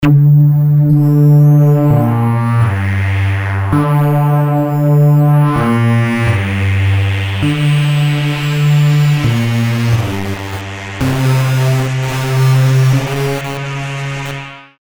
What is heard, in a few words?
Bass Macro Modulation; Heavyocity Mosaic; It Gets Nasty